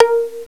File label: VIOLA PIZ; STRINGS3